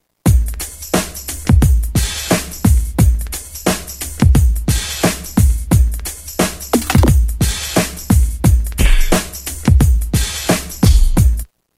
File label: Homeboy 88; Roland M-DC1